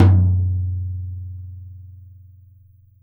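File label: Jazz kit tom, Drums Acoustic; USB Soundscan vol.10; AKAI; JAZZKIT 2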